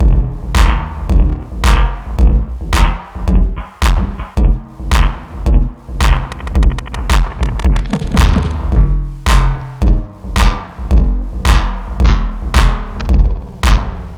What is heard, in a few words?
drum; prime on prime drum; Polyend